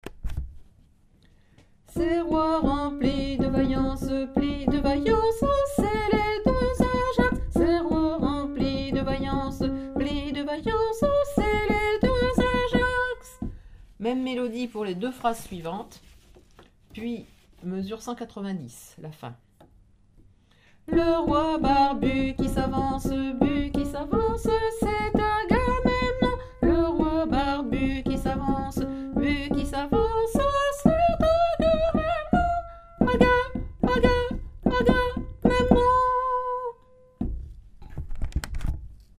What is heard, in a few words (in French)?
tenor